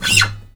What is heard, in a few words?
STL SCRAPE, Synclavier, GUITARS; Synclavier Sampler Library